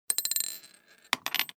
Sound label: money noise